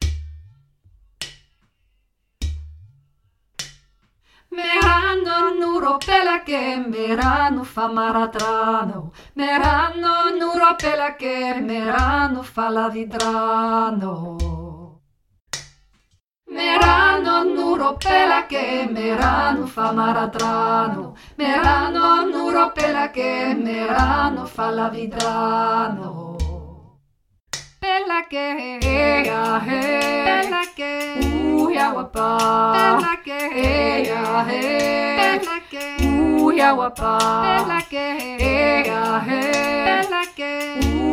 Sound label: tutti, chant; ME RANO - Madagascar; Orfées Productions